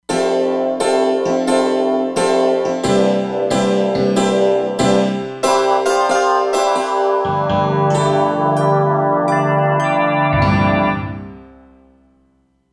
Groan Droan, E-mu Proteus-3; Factory patches

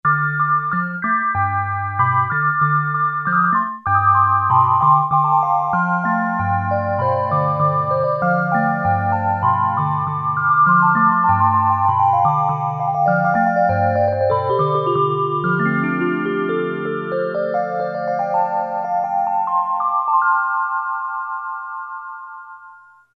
Elec Piano; Tones

